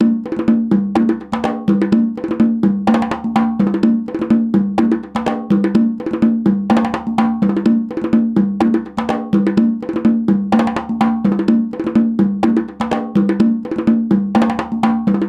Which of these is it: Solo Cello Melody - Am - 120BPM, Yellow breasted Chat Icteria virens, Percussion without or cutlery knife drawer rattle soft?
Percussion without